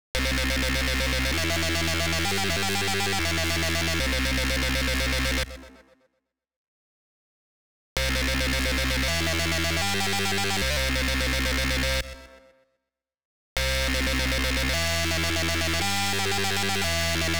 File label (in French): LFO sans delay puis avec un delay de 1 sur 32 puis un delay dune croche